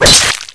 zombie throat slit

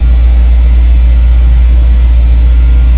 industrial machinery

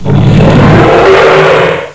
mutant alert

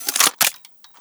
reload, weapons; gr9

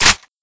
tommy reload clip in, weapons

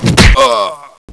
strike

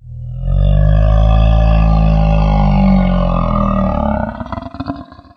moan; gonarch

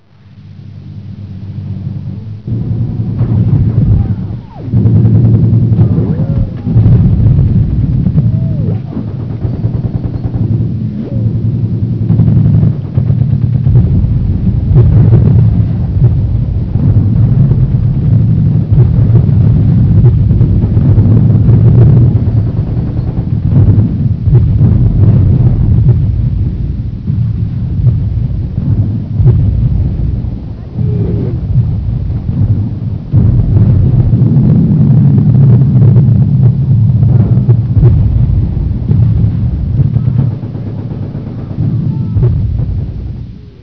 Battle

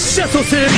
zombie

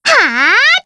charge; nepgear

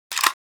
lr300 mag out

